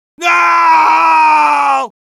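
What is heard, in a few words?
Heavy pain critical death, es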